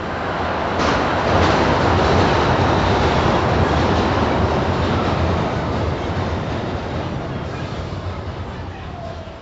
train sound

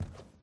foam floor

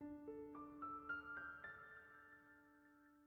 Piano; Frozen Flame; windows

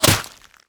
bullet impact ice; Infernal Radiation

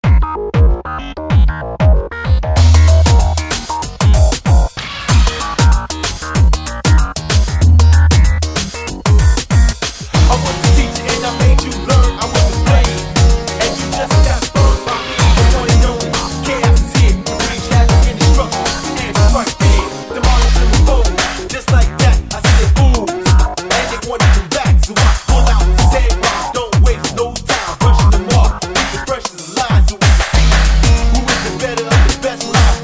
Solo Bass Mix; Dance Dance Revolution; DDR

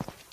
Footstep Rock

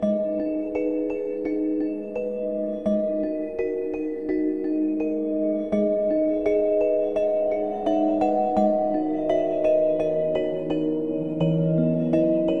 Music Box; mario the music box remastered; windows

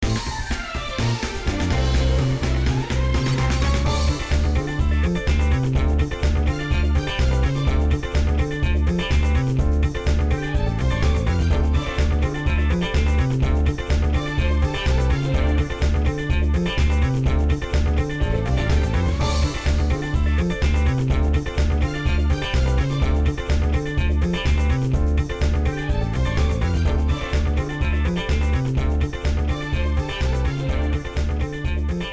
Level Cleared; Block Breaker Deluxe Collection

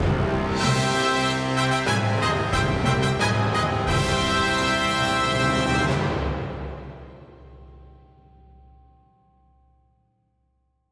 Cinematic Music - Regal Fanfare Stinger; littlebigplanet karting ps3 gamerip 2012

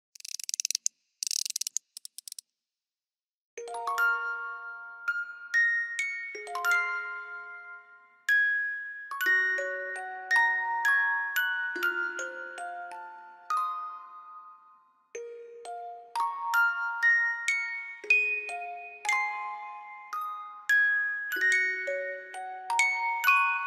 Music Box; Bayonetta Origins Cereza and the Lost Demon

